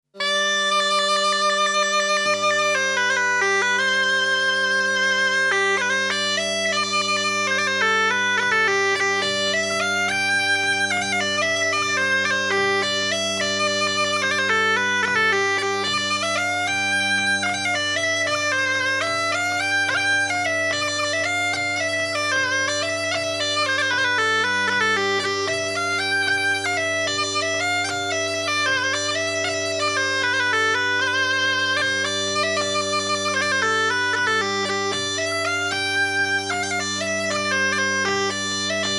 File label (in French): valse; La valse a Guyot